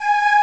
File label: Choir Aahs G#5; Hexen Demo; Hexen Music; Hexen 6vox